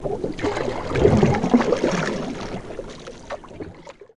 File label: Canoe Paddle Stroke Underwater